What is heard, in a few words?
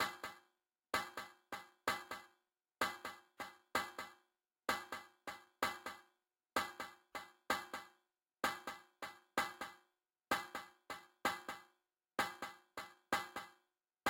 PERC